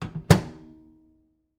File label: Household Microwave Close Door